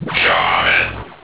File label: shrink; shaman